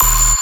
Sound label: Bass Hit D#, Dubstep